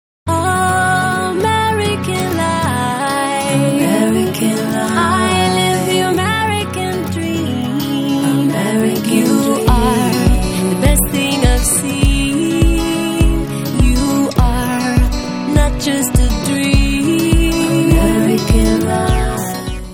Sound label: Chorus